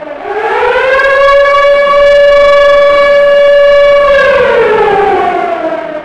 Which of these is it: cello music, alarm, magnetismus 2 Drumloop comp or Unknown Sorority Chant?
alarm